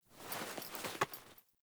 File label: Foley Action Default Song Flute Un Equip, Foley Default Clothing; Characters; Player